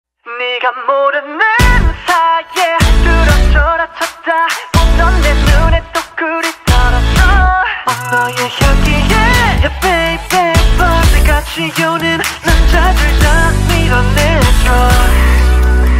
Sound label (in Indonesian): Nada Dering